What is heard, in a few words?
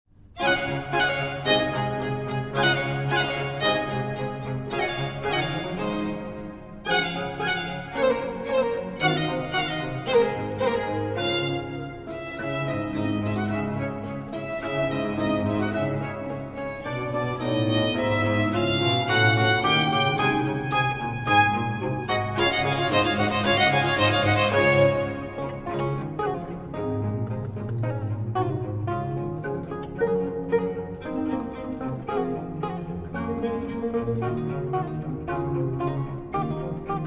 Concerto For Lute, 2 Violins and Continuo in D Major, Allegro Giusto